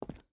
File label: footstep rubber boots concrete walk; Rust